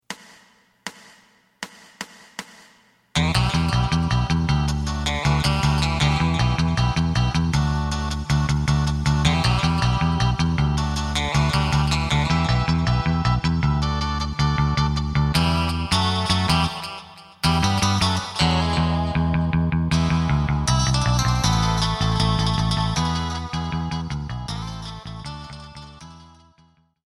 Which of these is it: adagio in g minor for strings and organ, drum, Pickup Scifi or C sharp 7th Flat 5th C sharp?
drum